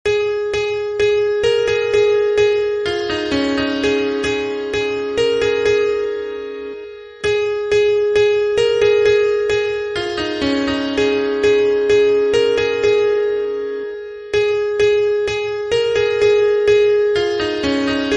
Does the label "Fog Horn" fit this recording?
No